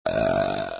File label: burp